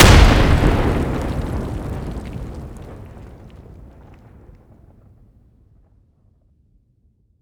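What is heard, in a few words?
grenade explosion distant; Escape from Tarkov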